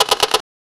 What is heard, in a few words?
ford crown victoria polic